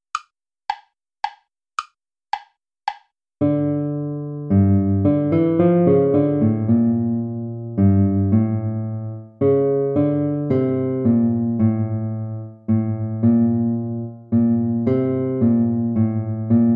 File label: courante play along; haendel georg friedrich